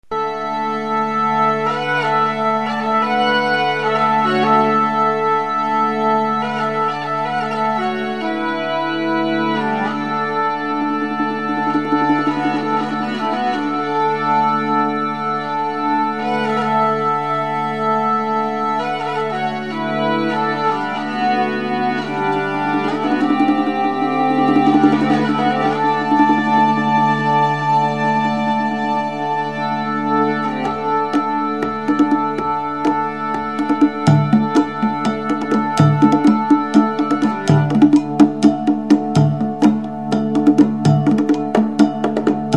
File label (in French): tympan